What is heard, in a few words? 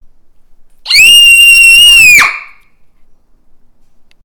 Little Girl Screaming